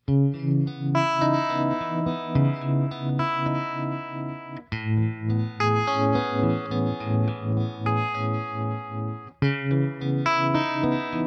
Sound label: Stratocaster through 6V6 Princeton Harmonic Tremolo sounds playing with depth, speed; Terrain Deluxe